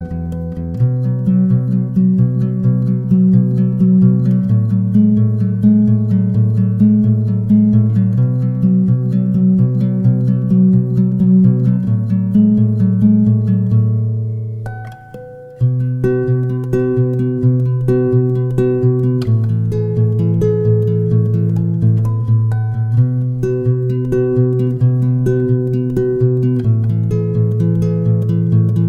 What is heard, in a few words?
Classical Guitar; Melody Eighteen (Classical Guitar); MIUI12